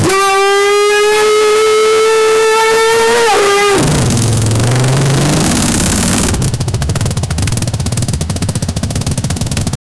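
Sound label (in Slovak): rytmická melódia zahraná, formula 1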